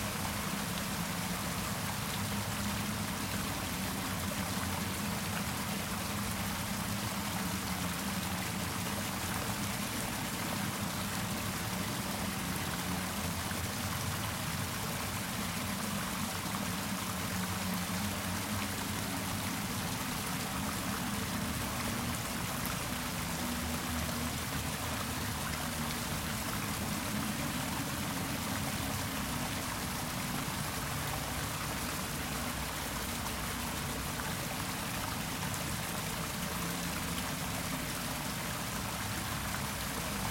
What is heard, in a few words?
underground waterfall, ambience; pf2e; hellbreakers